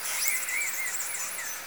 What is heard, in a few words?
call, Sea Sounds